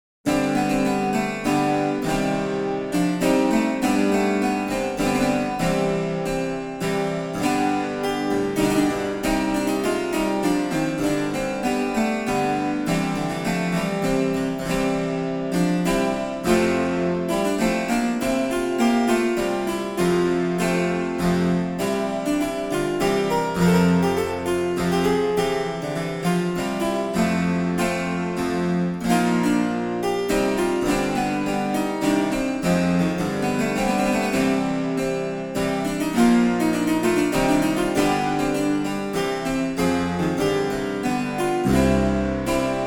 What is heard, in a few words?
German Harpsichord 1741 2x8; Excerpt from My Ladye Nevels Grownde by William Byrd; edition organeum